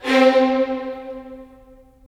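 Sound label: strings violins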